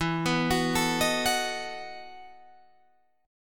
E Major 7th Suspended 2nd Suspended 4th, Guitar, Drop D